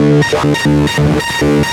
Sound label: pure synth, techno, 138, d; Techno ID; instrument-loops